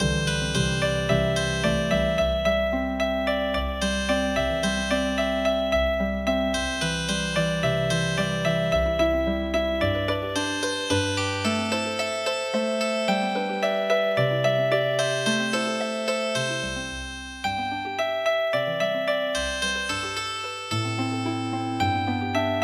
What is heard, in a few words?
Healing Harp; Pustono ludo i mlado